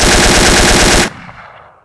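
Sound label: tommy gun; thomy gun; Mafie 1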